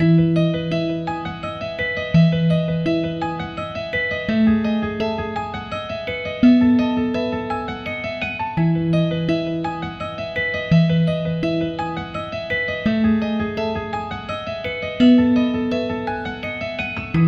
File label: Golden Bell, phonetones alarms; LG X power 2